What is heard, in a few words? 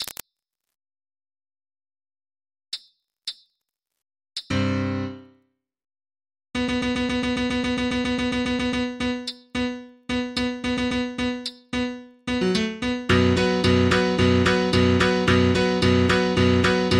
Music Only Windows XP 98